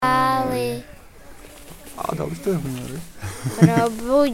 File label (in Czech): slavíci; ornitologický víkend